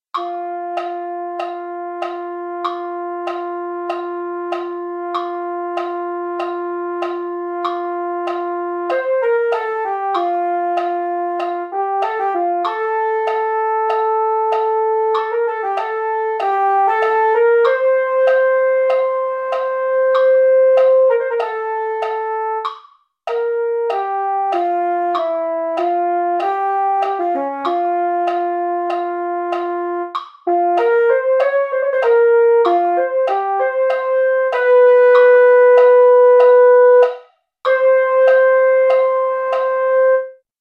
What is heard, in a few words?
mellophone, MIDI